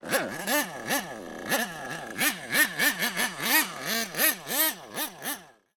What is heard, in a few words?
leisure toy rc car nitro engine rev drive off slight